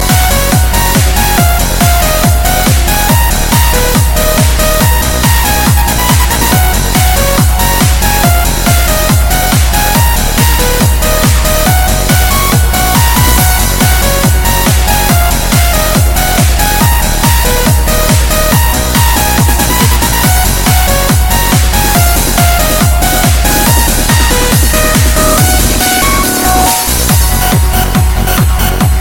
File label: Cute Techno